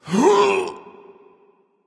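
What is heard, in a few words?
nonverbal grunt lift; smite; voicepack; ullr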